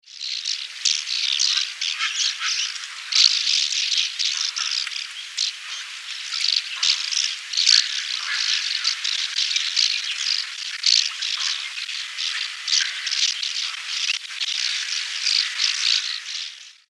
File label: streak throated swallow